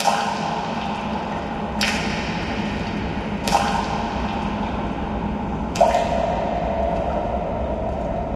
localized drips, ambient lair; video games; Source Engine; tf

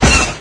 disparo; Source Engine; deathrun starwars

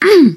hurt minor, teengirl survivor voice; Left 4 Dead; Source Engine

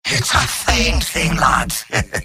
cheers

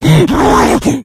rage, infected, male

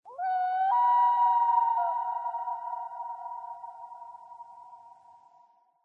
animal call bird loon; Source Engine; tf; lair

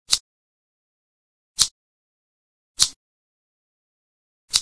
snip snip, ambience; Source Engine; dod

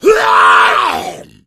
hunter pounce; source engine; left 4 dead 2

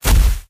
giant, player footsteps

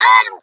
hot laser; Portal 2; Source Engine; video games; eggbot

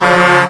humvee horn; vehicles; source engine; left4dead2

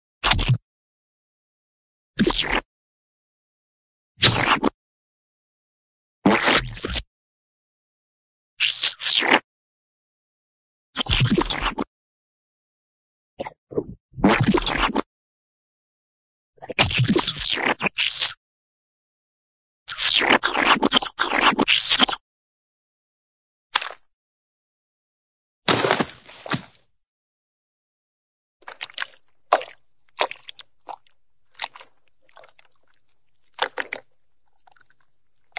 splat, cartoon paint fight glop

